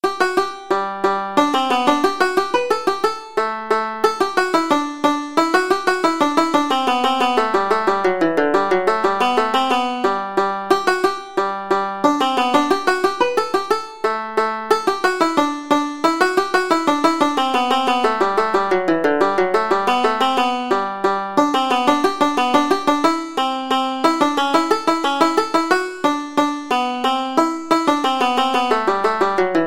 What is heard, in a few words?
Sailors Hornpipe, banjo